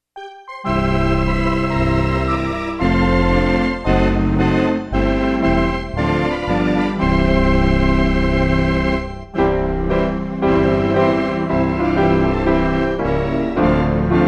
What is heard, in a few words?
instrumental reference mix; When We All Get To Heaven